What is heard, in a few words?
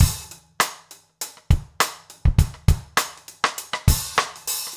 basic funk rims